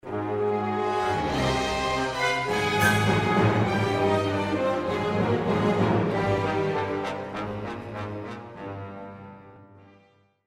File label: evil music; jafar